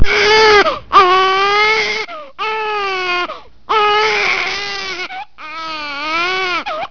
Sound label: Crying